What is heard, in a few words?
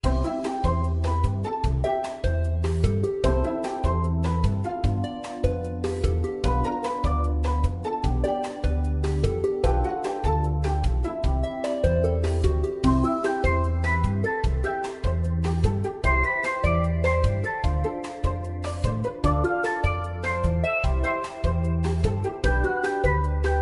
gamemusic; fruitslasher